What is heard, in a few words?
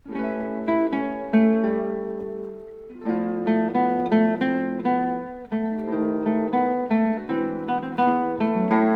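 guitar restored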